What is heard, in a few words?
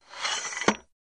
Tripod Leg Telescop